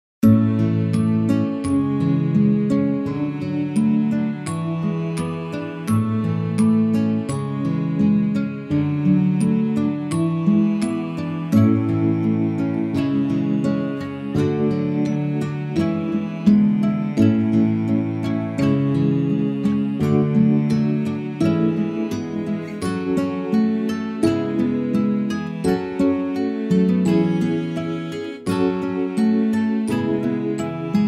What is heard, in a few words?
instrumental; l'alphabet